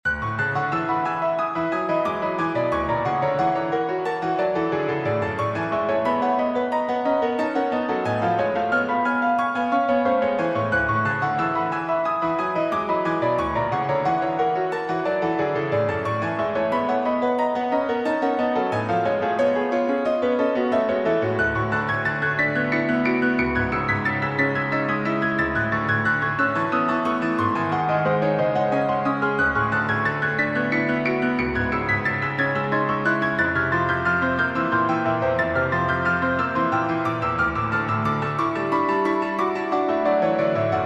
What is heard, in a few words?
Arpeggio Etude, for piano